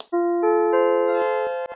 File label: sonic logo; storybeam